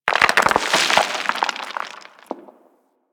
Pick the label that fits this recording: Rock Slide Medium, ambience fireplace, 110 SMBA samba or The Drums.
Rock Slide Medium